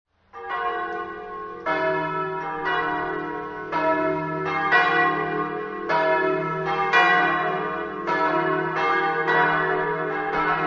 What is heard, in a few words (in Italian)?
campane; squirino